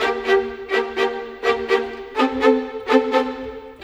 Violins; Sonic Foundry (Sony Creative Software); Orchestral Series VOL-4; Rock and Pop; Rock-Pop 18

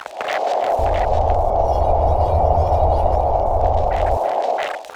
loop, techno atmos

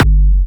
Jump Kicks, Bassdrums; Vengeance - Essential Clubsounds Vol.5

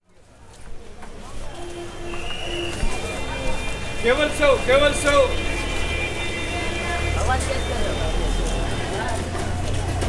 BOMBAY, AMBIENCE, Indian Traditions; USB Soundscan vol.45; AKAI

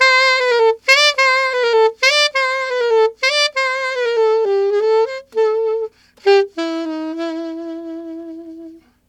Alto Latin in A min, Sax; Sonic Foundry (Sony Creative Software)

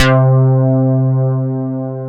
SYN, S CLAVI

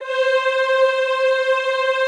C4, Classical Choir; Best Service; Extended Classical Choir

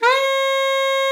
SLID C#5, ALTOSAX; Xsample VOL-2

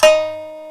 D2#, ETHNIC; SYAMI

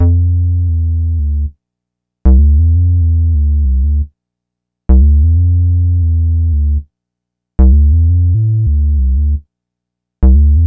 Synth Bass

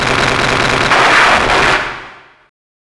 WAR FX

